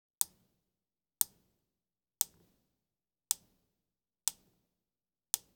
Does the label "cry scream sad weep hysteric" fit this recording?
No